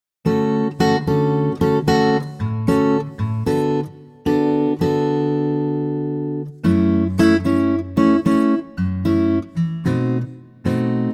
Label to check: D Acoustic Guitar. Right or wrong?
Right